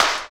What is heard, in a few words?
CLAP SNR; East West - Phat & Phunky CD2; 90's Sample CDs